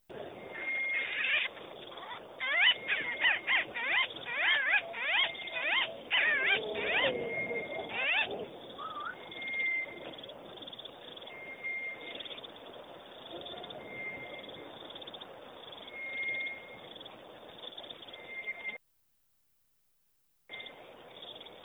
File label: Variety Of Bird Calls